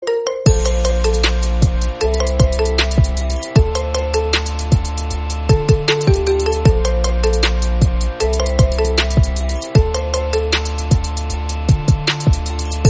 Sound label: Iphone Remix Instrumental